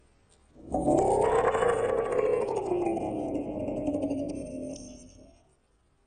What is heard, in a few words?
Allosaurus, Dinosaur Roars